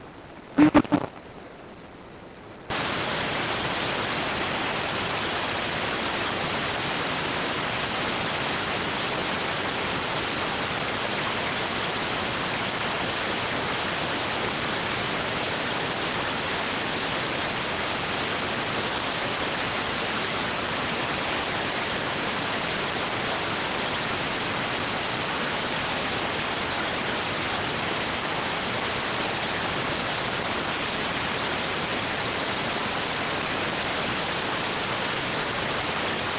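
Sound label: radioteletype receive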